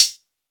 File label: muted foot hi hat sound f key, Royality free hi-hat sample tuned to the F note.